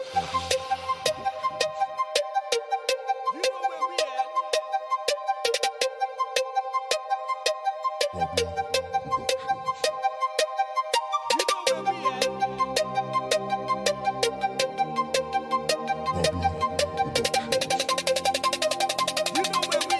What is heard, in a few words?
Reggae Dancehall